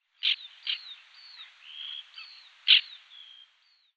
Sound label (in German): Weissfluegelseeschwalbe Chlidonias leucopterus; Vogelstimmen